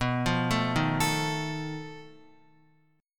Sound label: B 7th Flat 5th B Guitar; B7b5 arpeggiated; JGuitar